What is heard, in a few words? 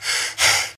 Prisoner Running